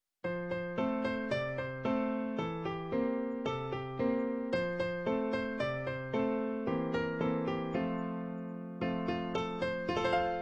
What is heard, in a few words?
camptown races, klavier